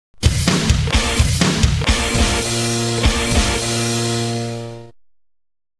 jingle double cheese; mtvpulse

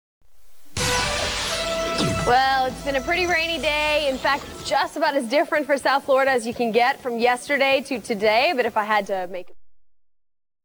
jingle weather; abc local10

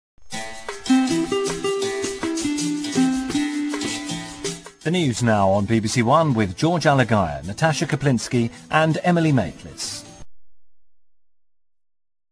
jingle acrobat; bbc

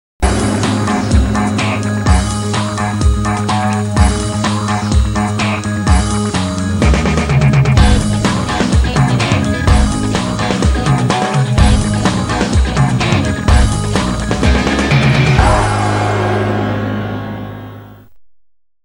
jingle; foxcrime; crime files

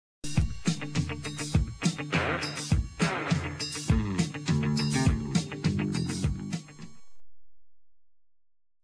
jingle serie; nt1

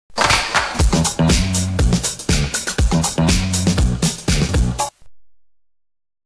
jingle; nt1

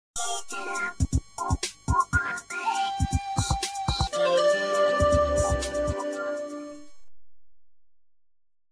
jingle magazine; nt1

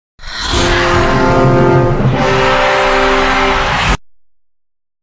jingle; eurosport; live jo

